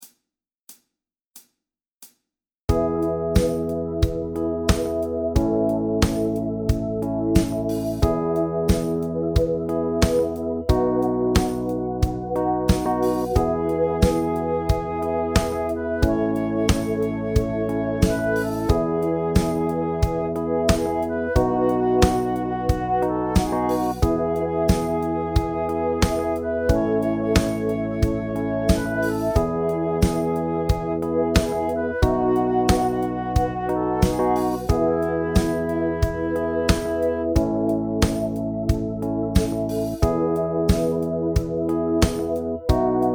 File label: Bass E1